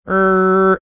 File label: making machinery moving sounds